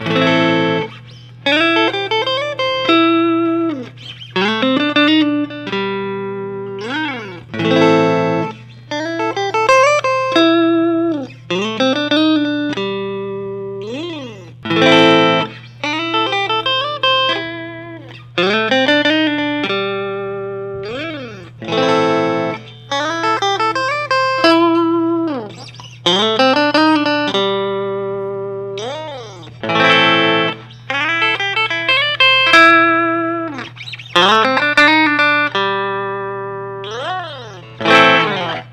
fender custom shop 60 stratocaster

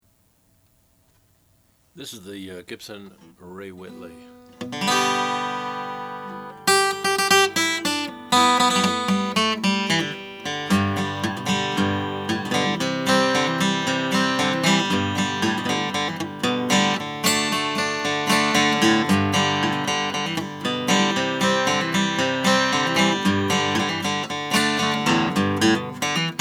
gibson recording king ray w sunburst

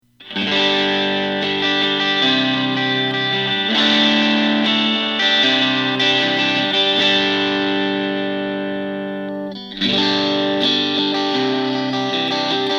marchione custom strat style solid

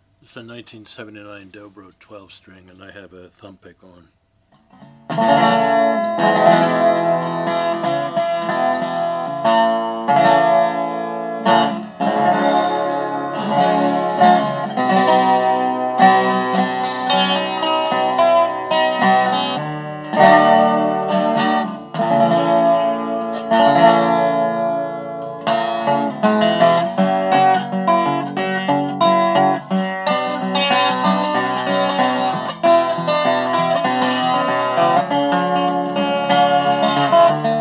dobro 12 string chrome engraved

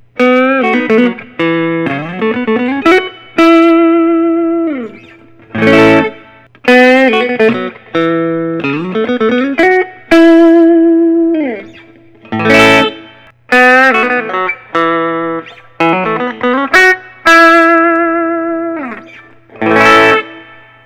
Thorn deluxe 90; black limb caramel

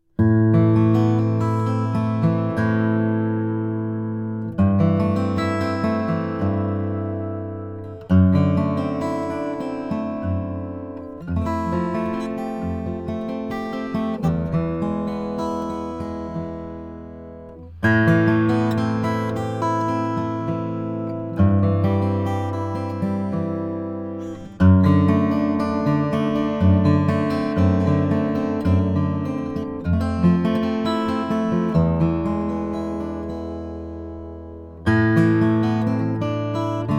martin d 45 brazilian rosewood

